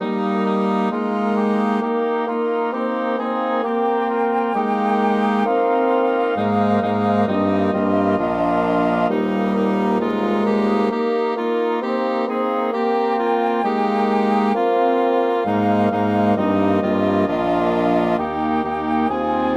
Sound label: for woodwind ensemble; ubi caritas amor